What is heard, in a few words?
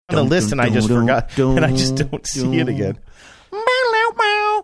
singing theme; law order